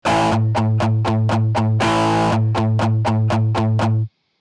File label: Guitar